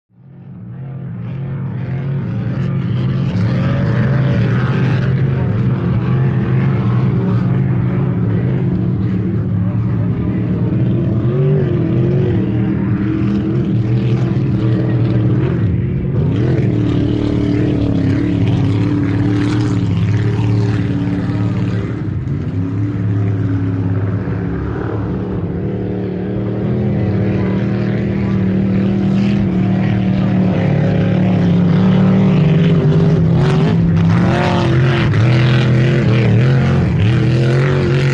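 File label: Power Boat Race Ambience, off shore power boat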